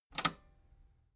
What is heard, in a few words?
metal button robust spring release disable